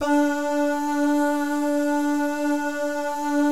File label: BAH GUY, Jazz